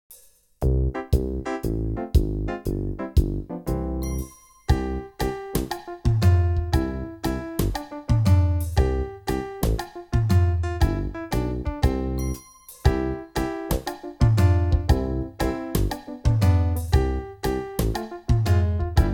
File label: Knock knock backing